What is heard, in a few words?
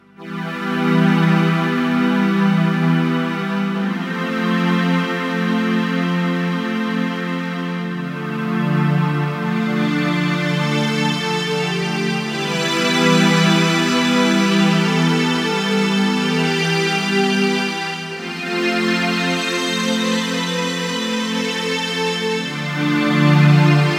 String Wash; Kawai K1; Bank 2 card A